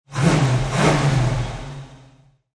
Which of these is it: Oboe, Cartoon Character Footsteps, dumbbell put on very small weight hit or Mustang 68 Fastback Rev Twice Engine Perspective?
Mustang 68 Fastback Rev Twice Engine Perspective